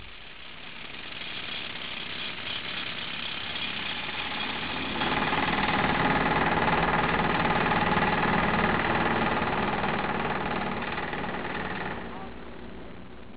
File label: tractor